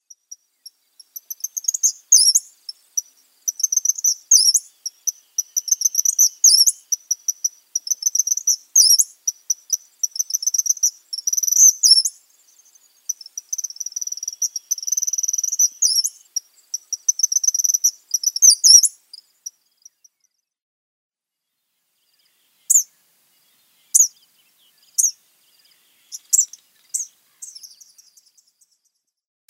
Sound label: Eastern Kingbird